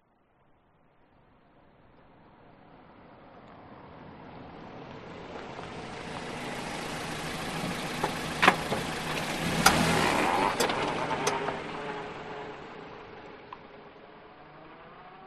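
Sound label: vehicles car approach slow stop then reverse away road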